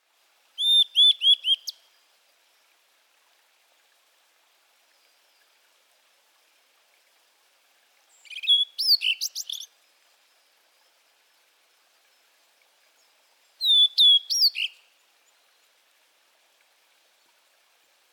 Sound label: cyanoptila cyanomelana twitter